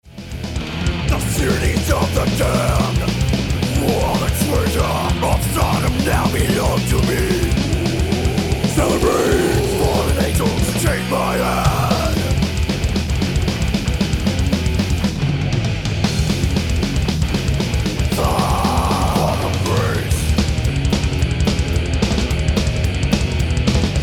riffs

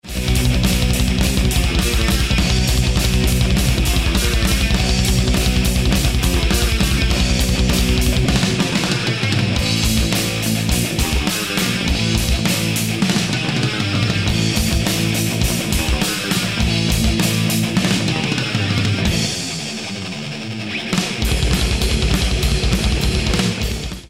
double bass

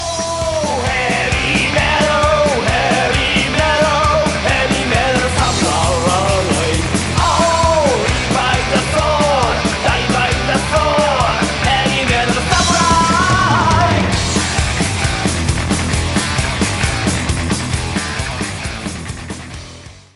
Heavy Metal

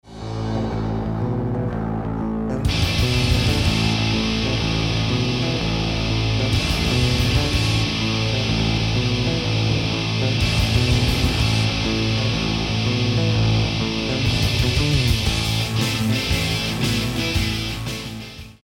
bass

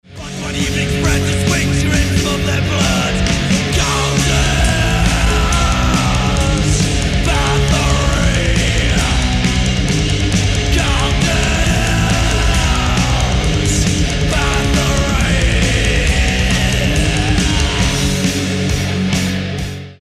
chorus

